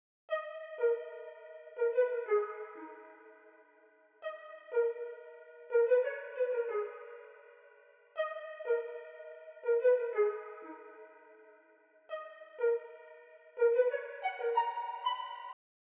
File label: 122 BPM G# Min Lead; Cymatics; 2022 Melody USB Expansion; Class